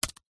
computer keyboard apple mac magic single key press; zapsplat